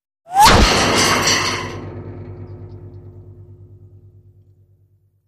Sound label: Bullet with Whir Impa, metal